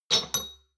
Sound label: shot glasses pull out hard, gastronomie; berufe; soundpool